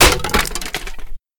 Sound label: wood brake; DeadFright